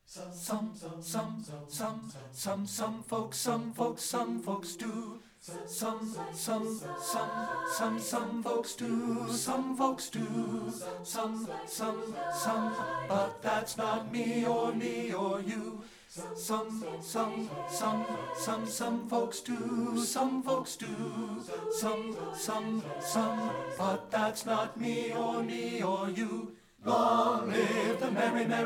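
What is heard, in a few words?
madrigals, tenor; STEPHEN FOSTER MADRIGALS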